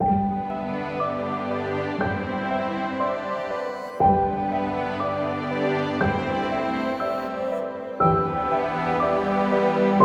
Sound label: Dainty Ambient